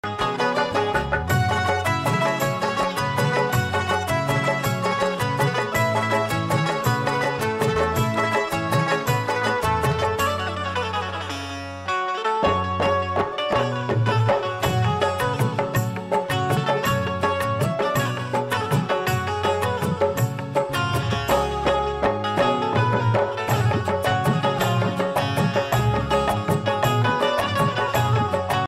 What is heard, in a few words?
instrumental